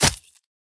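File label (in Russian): chopping object machete on the fly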